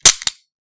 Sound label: gun dry fire, weapons